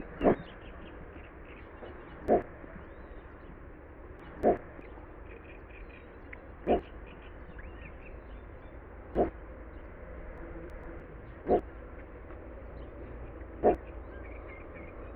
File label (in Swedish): Dvargrordrom; Little Bittern; Ixobrychus minutus; Marco Dragonetti